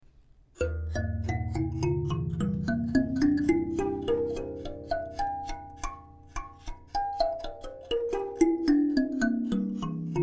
klangbeispiel daumenklavier

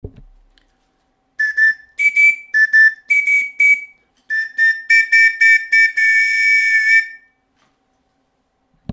klangbeispiel filimbi 2 toene

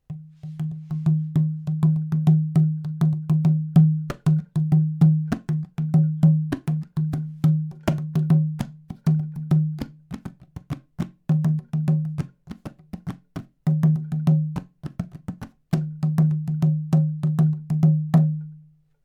soundbeispiel haya drum

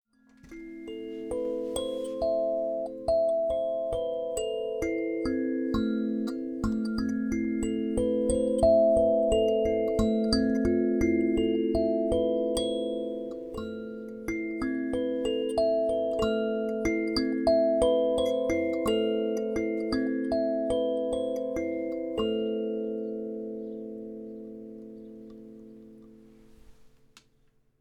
klangbeispiel sansula

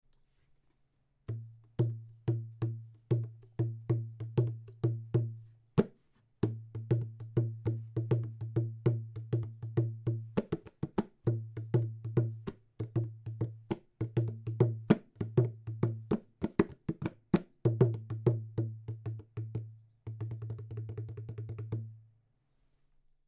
Papprohrtrommel

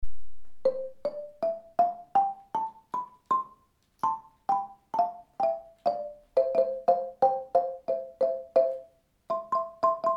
soundbeispiel afrika xylophon

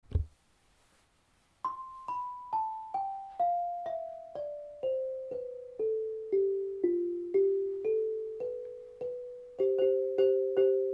solnhofener lithophon

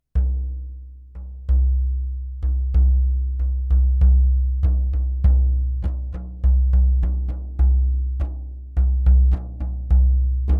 klangbeispiel grosstrommel 50 cm

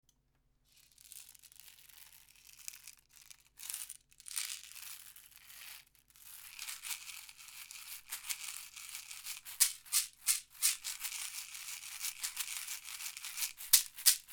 klangbeispiel caxixi klein

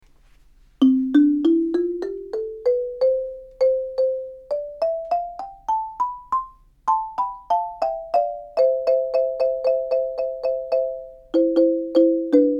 soundbeispiel sopran alt xylophon

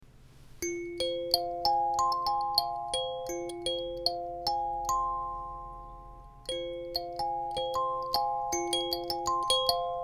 klangbeispiel mini kalimba 5

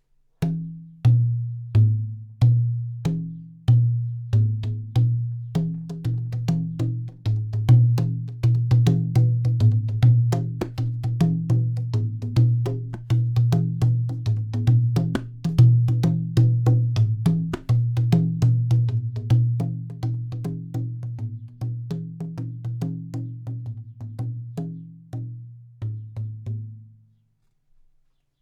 soundbeispiel basstrommel set rasiert

